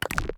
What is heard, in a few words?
back button click; megamix